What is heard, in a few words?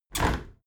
window handle squeak turn open halfway heavy; objekte; fenster